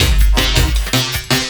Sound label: LOOP, 160 BPM, Drum & Bass